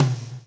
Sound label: lash, backbeat